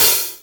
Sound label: HH hh; Best Service Gigapack 2